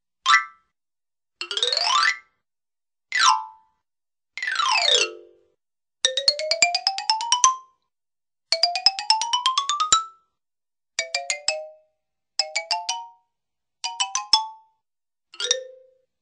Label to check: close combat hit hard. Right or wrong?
Wrong